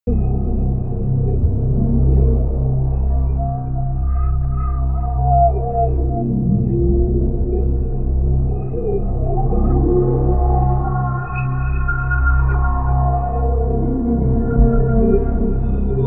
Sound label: drone genetic